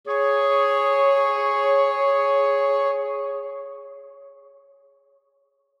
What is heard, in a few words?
Woodwinds Swell, Woodwinds Swell Light; Musical; Blastwave